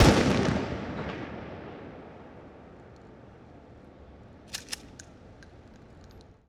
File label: Mossberg Shotgun Blast & Reload Wet; Weapons & Firearms; Sony Pictures Sound Effects Series Volumes 1-10; Sony Creative Software